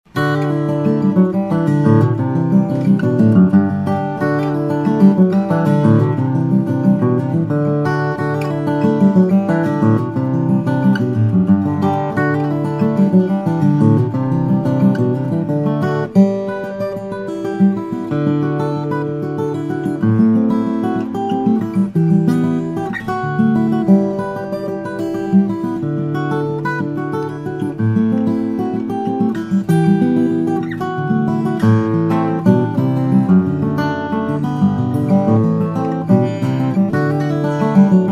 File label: madrone, steel string guitars flattop; mccollum; dream series 1 00 000